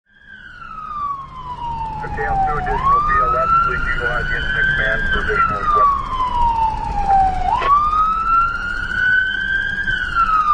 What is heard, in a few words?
squad responding to working house fire riding siren seat; squad 52; goodwill 2nd in